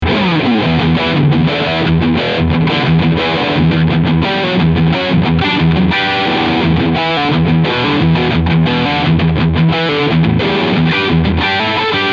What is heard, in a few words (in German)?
Schecter KM-6 Overdrivesound Steg PAF Modus